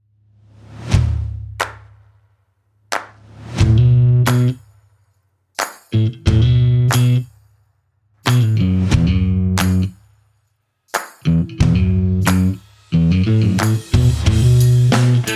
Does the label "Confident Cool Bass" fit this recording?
Yes